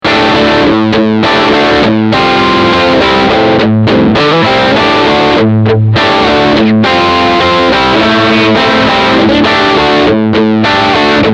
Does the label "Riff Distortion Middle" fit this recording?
Yes